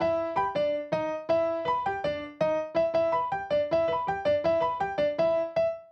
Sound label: Acoustic Piano, Loops